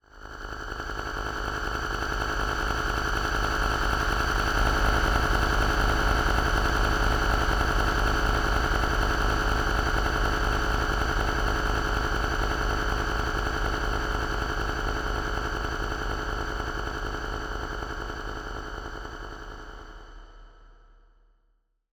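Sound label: designed eerie atmospheric element noise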